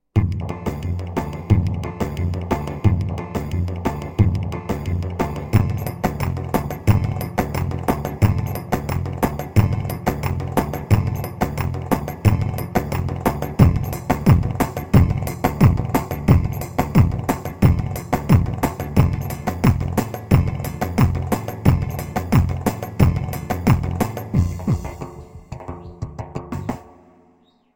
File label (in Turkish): pa 500 tr silifke, korg; tr karisik ritimler